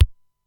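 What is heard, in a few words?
Simmons SDS-9 bass drum, Drum Machines; Factory D5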